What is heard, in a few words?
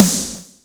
snare; Breaks N Beats Sound Kit; Beatz Kit